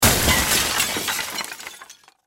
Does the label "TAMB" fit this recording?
No